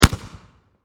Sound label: Explosion Firework Mortar Launch; Fireworks; SFX Bible 6